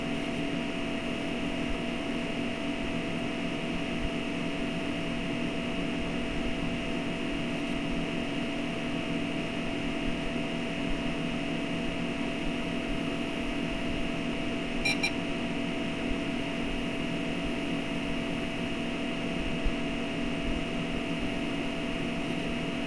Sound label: drive beep